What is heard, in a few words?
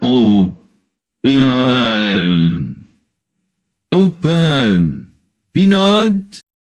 jingle